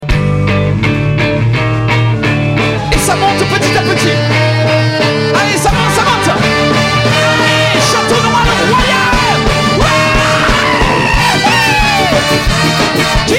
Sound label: retour fanfare